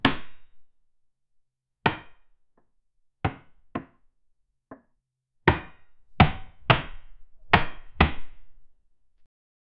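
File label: a sound of chess piece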